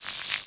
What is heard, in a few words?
Dragonfly; The Princess and the Frog; wii windows; Hub Bayou; Target Hungryfrogs; Dragonfly Wings